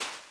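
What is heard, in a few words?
sand walk right, footsteps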